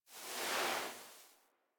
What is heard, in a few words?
Foley Idle Sit; Enemies; Kubrow; Warframe